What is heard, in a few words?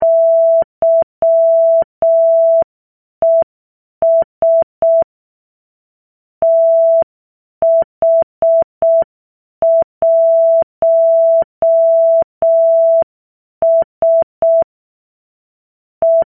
morse